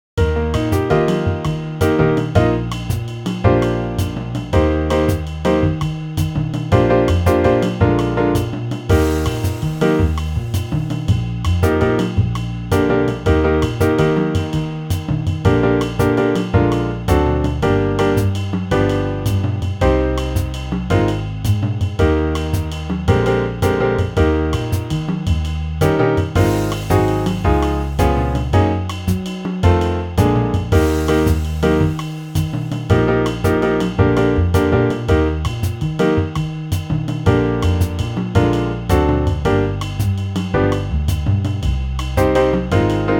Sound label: Eb Moving Up G, brass